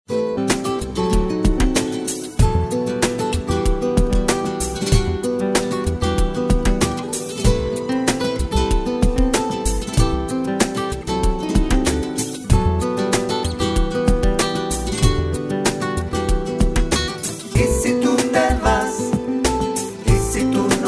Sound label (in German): Solo kurz